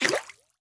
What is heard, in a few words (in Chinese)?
美西螈攻击声